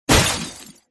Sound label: skill bullet; rock buddha